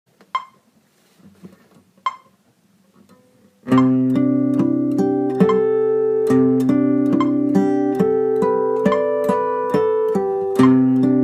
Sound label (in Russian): Naturalnye flazholety; uprazhneniya